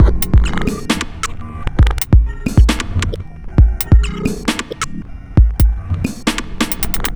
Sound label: Bpm134 Break, Dubstep, Drum Loops; Micro